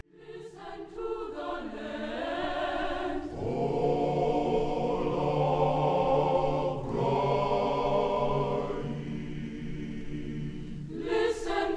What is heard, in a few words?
Choir; Tuskegee Institute Choir